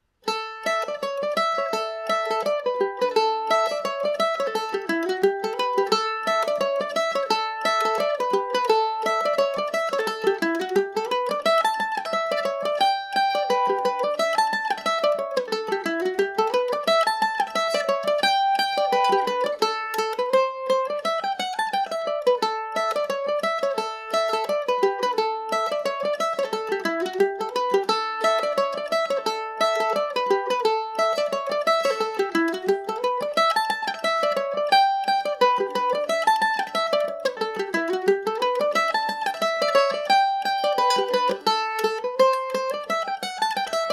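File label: A mixolydian, Irish mandolin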